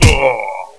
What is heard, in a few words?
punch ko